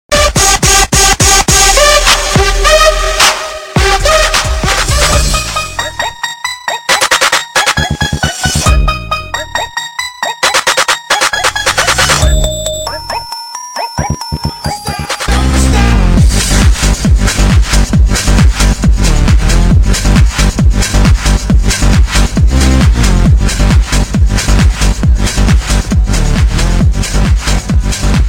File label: mix house